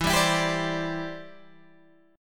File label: E Minor 7th Sharp 5th, down, Guitar, Open G